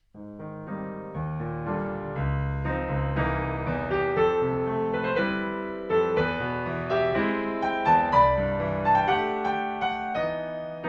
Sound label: Piano